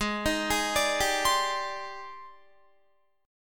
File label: Ab Major Sharp 11th Ab down Guitar; AbM#11 arpeggiated; JGuitar